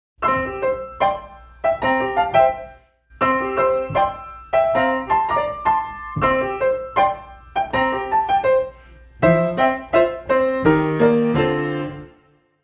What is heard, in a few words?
piano quiz; DELTARUNE